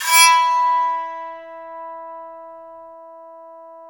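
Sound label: bowed psaltery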